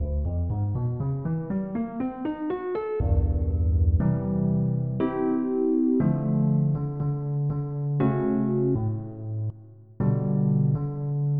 Flat Piano; FF7

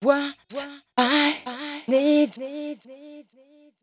VOICE GRL HOUSE 125 A, House Voice

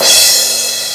cymcrsh; Gravis Ultrasound Patch

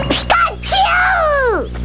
Pikachu error; Pokemon; Meowth

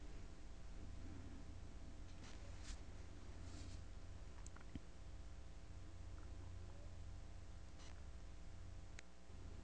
LINK TONE

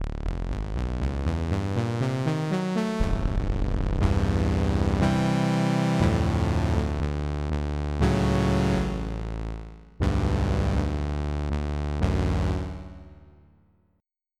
JX Q Brass, synth

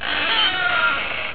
slide; Realmz 4.2.2

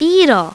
beetle

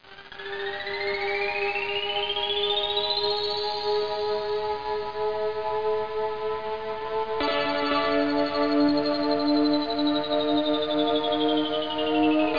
Space Song Short; Let's Discover Michigan!

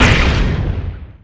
Cannon Fire; Defiance

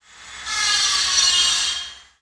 CIRC SAW, construction; zones

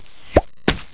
CLEAR BOX

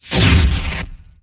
SHIELD

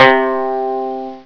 Banjo Low; Instrmnt; Sound Library 2000; Wayzata Technology

